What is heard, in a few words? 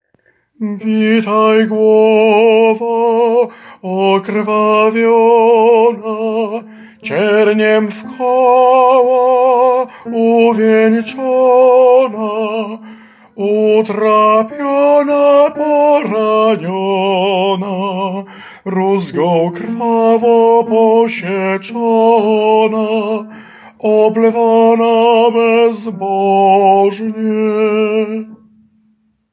TENOR; Witaj glowo